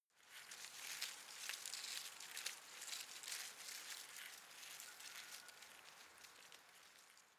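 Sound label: shrub small leaves rustle soft, garden; haus-garten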